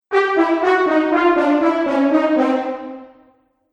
Cartoon Failure Brass

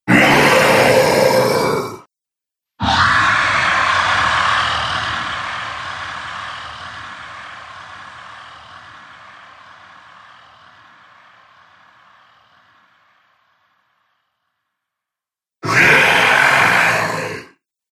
Three Dragons, monster; horror

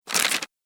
Turning Paper Pages; Paper Sound Effects; office

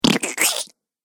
3 Stage Slime Ooze; Slimy

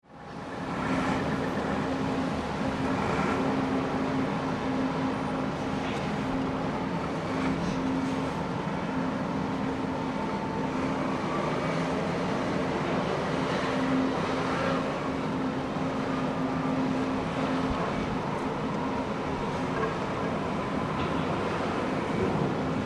Ambience Loading Docks Trucks Activity Industry; Boats (Sound Effects:Vehicles)

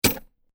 Chain Impact Chain Metal Impact; Metal Sound Effects; LYN103 package of Chain Sounds; Lynne Music

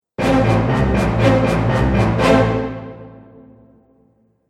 Action Film Dramatic Event, musical

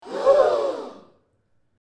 group gasp, Crowd-Small Group Gasp; Small Crowd, Mixed Group Of Men And Women Gasp In Surprise; Exclamations; Voice

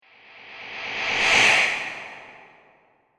Whoosh; Whoosh - Ride The Magic; Whoosh Sound Effects; fantasy sound effect; role playing games, magic videogames

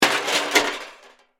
Crash Metal Object, Crash, Metal, Objects; Pots, Pans

